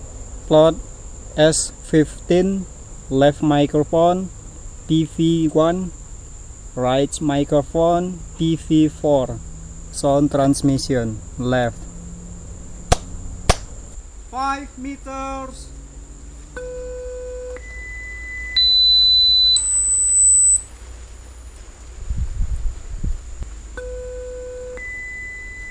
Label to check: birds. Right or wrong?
Right